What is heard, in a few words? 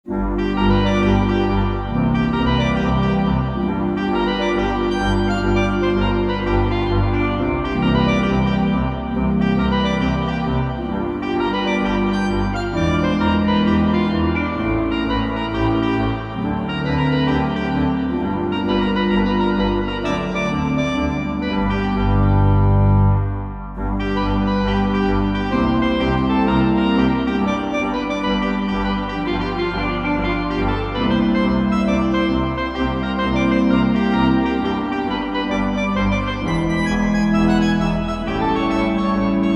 A liitle Organteq blues; ”Swing-Fox-Blues”; Musical Joke No 3; Pianoteq; Modartt